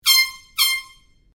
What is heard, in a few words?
Airhorn Small Blast Short Double, Compressed air horn; Sports; SoundEffects-Foundation1